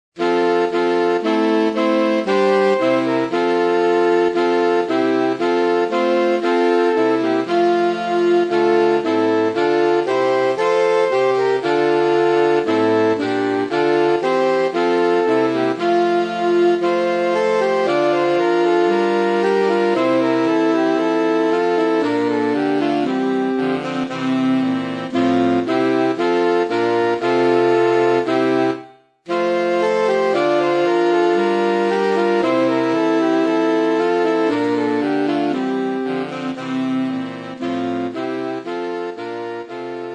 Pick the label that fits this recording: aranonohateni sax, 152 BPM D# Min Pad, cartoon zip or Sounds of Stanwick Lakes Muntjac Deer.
aranonohateni sax